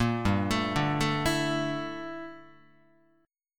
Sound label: G Minor 6th Add 9th G down Guitar; Gm6add9; arpeggiated